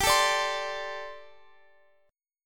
G Suspended 2nd Suspended 4th G, down, Guitar, Open F; Gsus2sus4 strummed